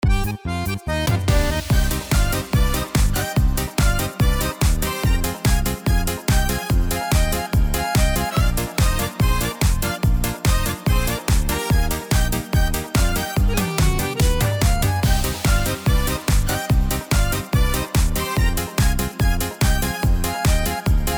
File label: Disco Style Yamaha; yamaha genos